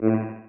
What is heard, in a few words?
alarm